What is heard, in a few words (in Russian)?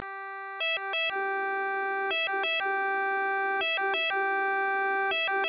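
звук скорой помощи в другой стране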